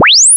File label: SINE